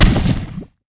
spore fire

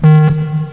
lo beep, ambience

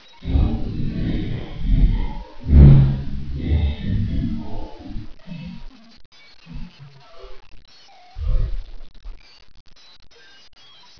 drowning, ambient; q3rally

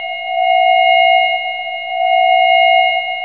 klaxon

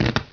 spark; ParoxysmII

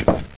wood hit; ParoxysmII; ambience

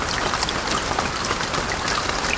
rain, ambience

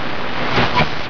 radio; nzp

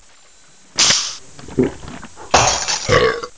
perk drink; nzp

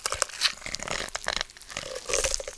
web spin; weapons

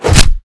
claws; weapons

